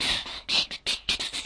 beatbox hiend

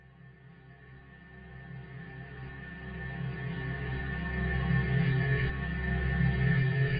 reverse in, Pads; single samples

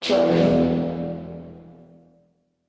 children echo; Ze Franks Pain Pack

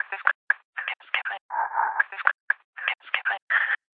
120 glitch loop; Ze Franks Pain Pack

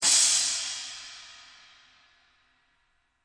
Splash, DrumKit; REAL LIVE KITS; Osama Qureshi DrumKit

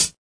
hat

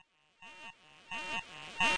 ringmod alarm fadein; Alarm Sounds

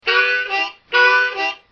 blues harmonica lofi, harmonica riffs

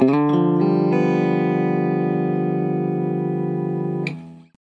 strummy g7 guitar

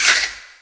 verby rattle, African and Eastern Percussion

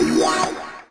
stabs hits

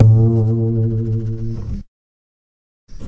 Upright Bass Notes

